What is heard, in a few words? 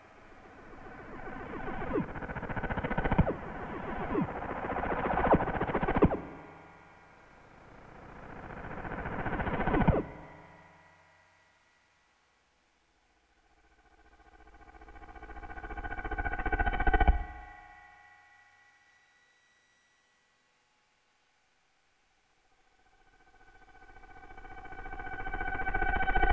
brunt long